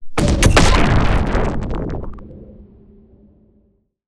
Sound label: Sci-Fi Energy Explosion